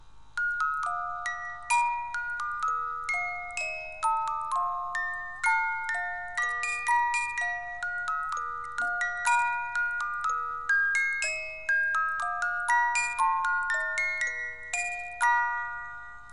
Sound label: Zauberflöte, Music Box; The magic Flute